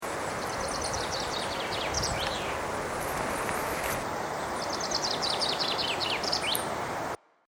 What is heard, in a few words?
chaffinch